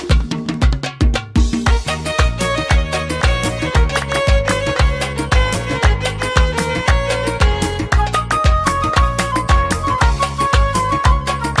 Melody

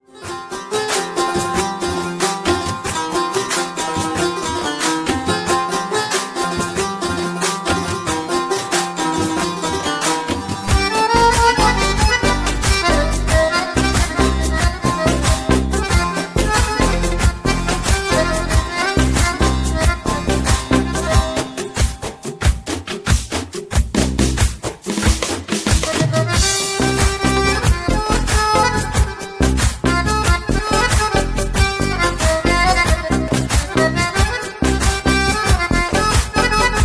Accordion, play back

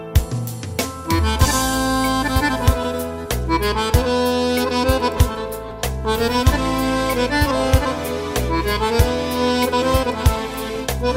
Accordion, play back

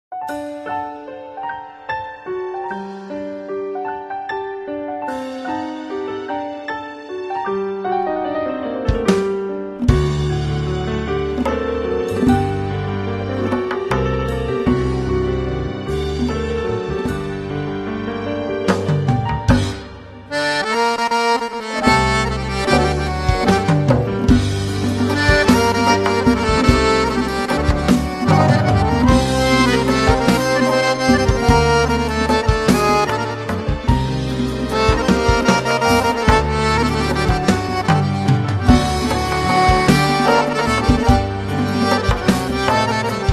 Accordion

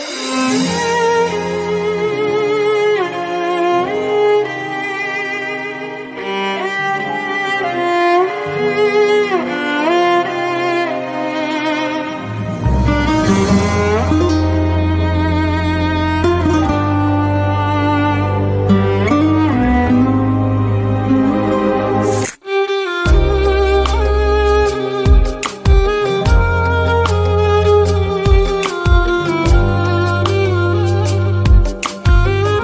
Violin

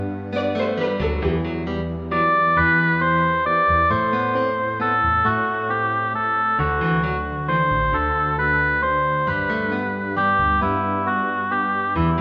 Violon

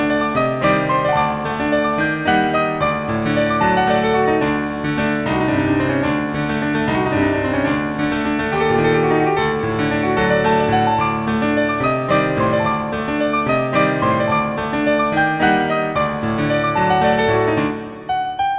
Piano

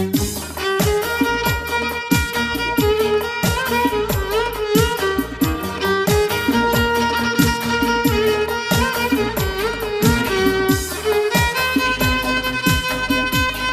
Violin

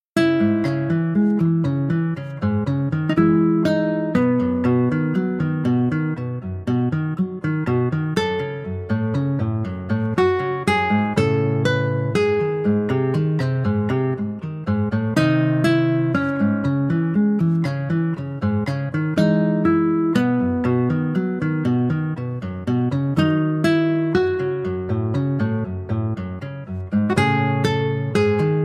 Guitar

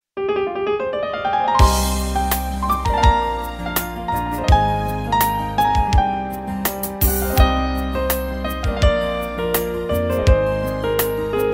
Accordion

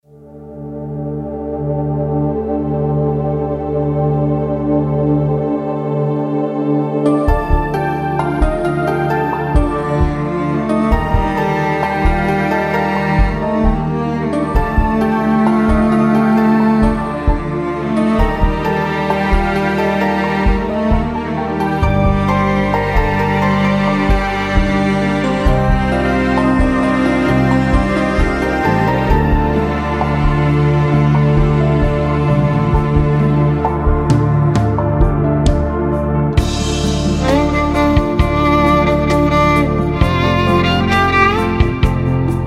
Violin

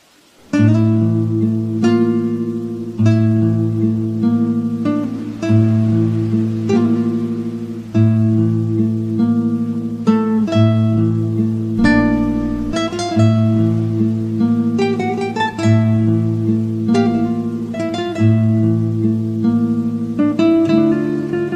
Guitar

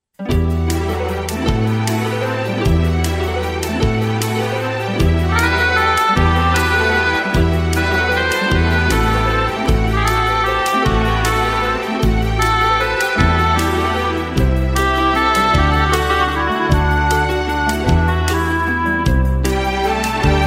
Flute

